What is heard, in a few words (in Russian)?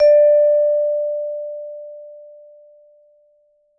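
вибрирующий звук ноты до 3 октава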